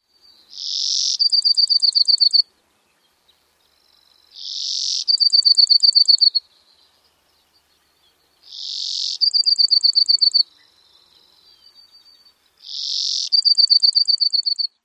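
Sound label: songs, Brewer's Sparrow; CA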